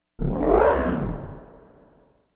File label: roar